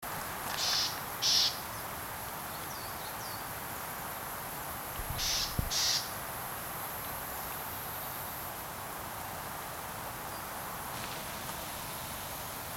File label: krupers nuthatch